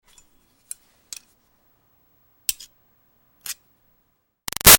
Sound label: Foley Rock Pick Scrape Archeaology Small Utensil; Archaeology; Rocks